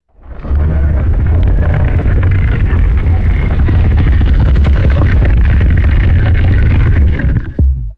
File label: deep groaning and creaking vine rumble